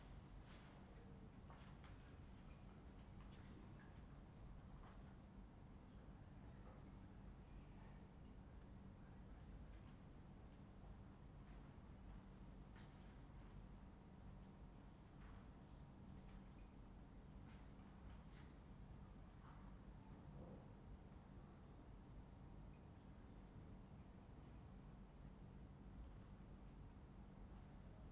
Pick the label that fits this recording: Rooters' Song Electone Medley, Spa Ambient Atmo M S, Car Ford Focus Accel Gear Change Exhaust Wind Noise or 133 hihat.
Spa Ambient Atmo M S